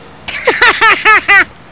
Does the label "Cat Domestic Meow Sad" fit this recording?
No